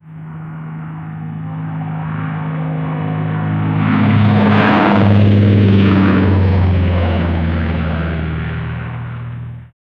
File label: giant plane boing 747 fly; airplane